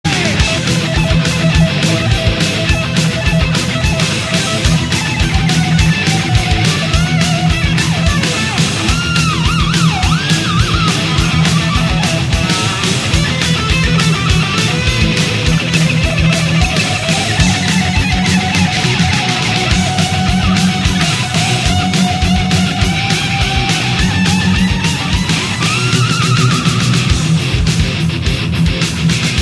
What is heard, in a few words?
Solo